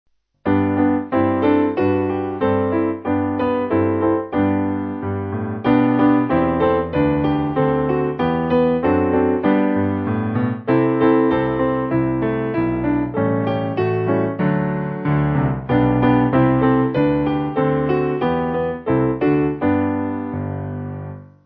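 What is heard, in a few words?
Piano, church music backing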